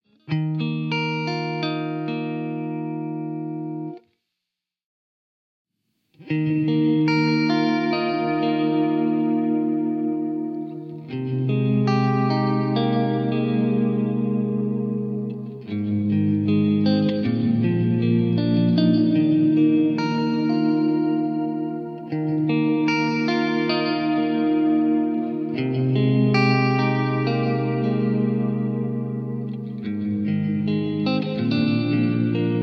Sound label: Warbly Hall